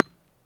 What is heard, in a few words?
ping stop; firefight